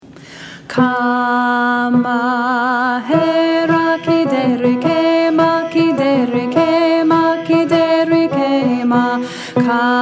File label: Alto; NNMP Area Honor Choir